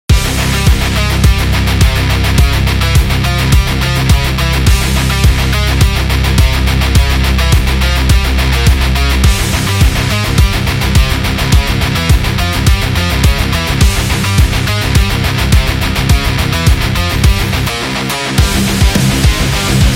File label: A Metal